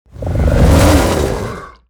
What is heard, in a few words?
charge whoosh; finalmother